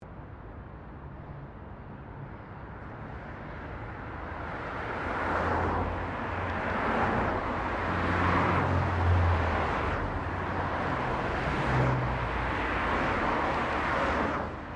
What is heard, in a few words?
Traffic Cars General Pass By; General traffic sounds; Traffic And Car Sound Effects